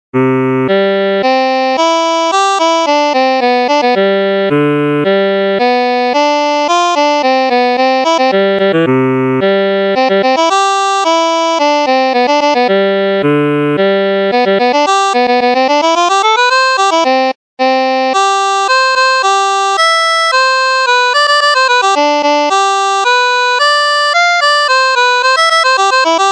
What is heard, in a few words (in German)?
Boarischer 2 Harmonikas Stimme; Volksweise